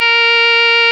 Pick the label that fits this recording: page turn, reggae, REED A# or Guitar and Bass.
REED A#